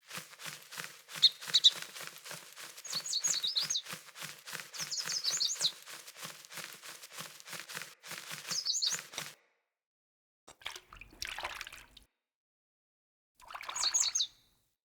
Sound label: Split Apart Sparrow; Cinematics; Bromius; Bromius Quest Split Apart